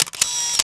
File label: Camera L